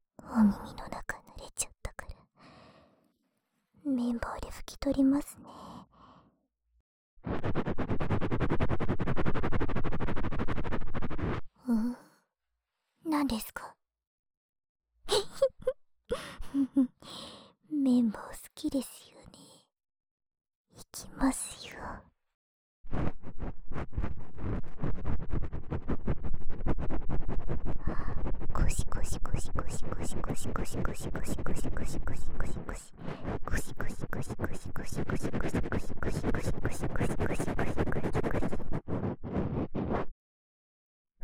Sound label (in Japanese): 【右】綿棒高速, 耳かき, ASMR